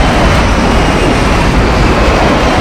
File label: mig29 close loop